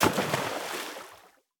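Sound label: Splash Medium; Boomerang Fu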